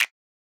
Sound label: Snap; Drum One Shots; Snaps; Cymatics; Cobra Hip Hop Sample Pack